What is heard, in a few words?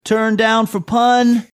singing turn down for pun